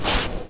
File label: slide